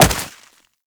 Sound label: Wall Jump Snow; Footsteps; WallRun; Warframe; Characters; Tenno